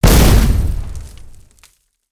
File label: mine explode